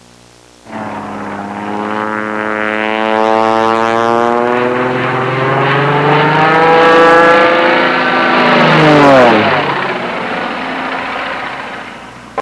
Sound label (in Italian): Aerei; trasporti